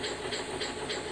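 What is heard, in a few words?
compressor loop, Train; OpenBVE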